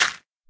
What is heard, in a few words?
gravel, blocks